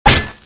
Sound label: hit world